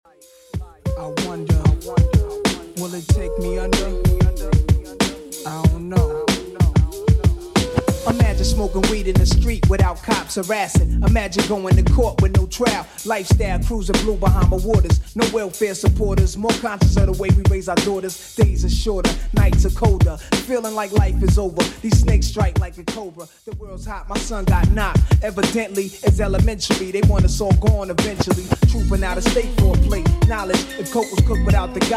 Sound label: 90s Hiphop Redrum 94bpm